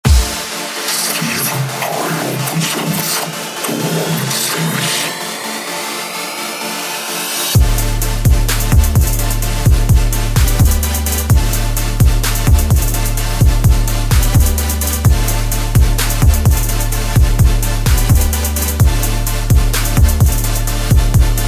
Trap; THE ONE; FL STUDIO

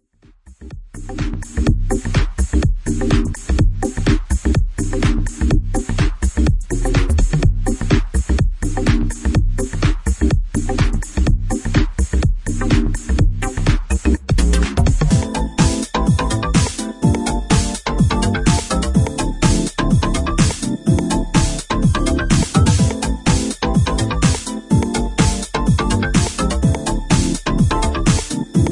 DEEP ELECTRO HOUSE

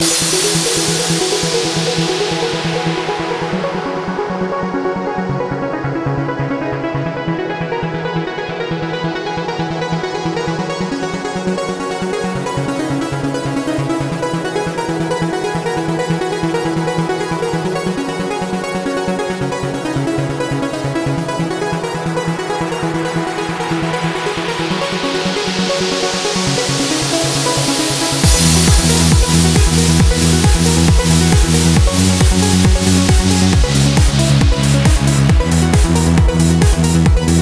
essential edm megariffs

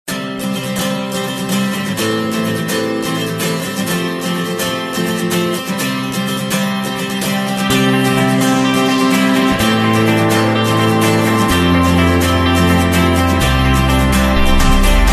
POP; ESSENTIAL POP MOMENTS VOL 1

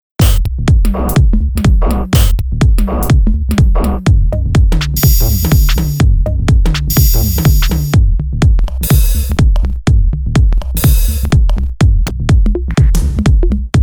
european tech loops

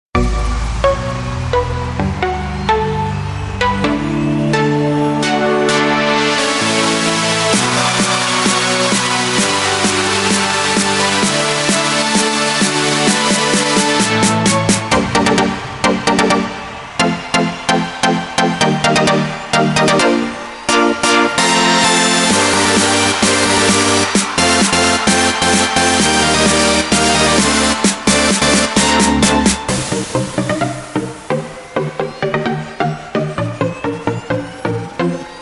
Melody; Melody Essentials Big Pack

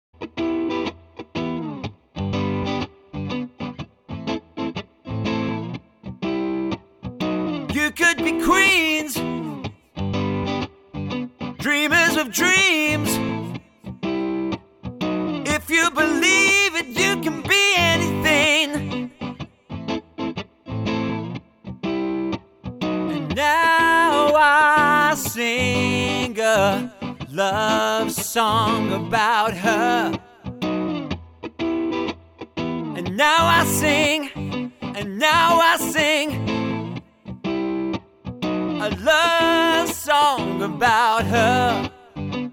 vocal; Vocal Attack

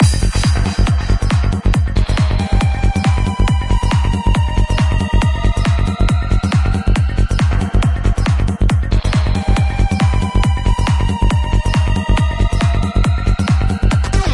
PROG PSY KITS KIT; DMS

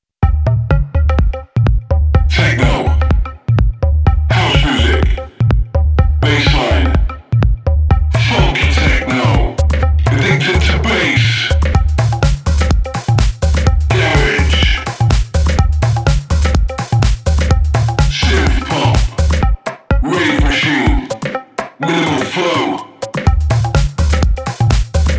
house voice

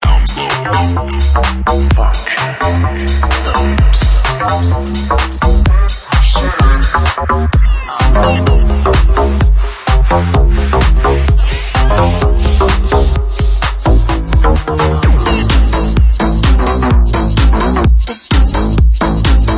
FUTURE HOUSE; SUPREME PRESETS

